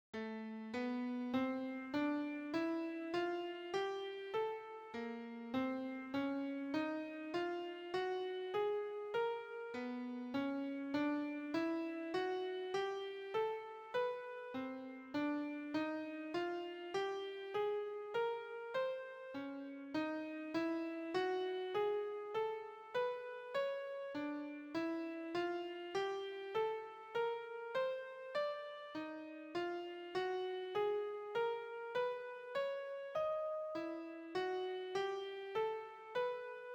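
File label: All Minor Scales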